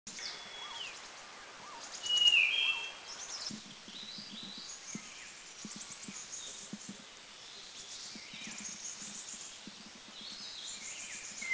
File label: Blue-fronted Robin; Dirang; India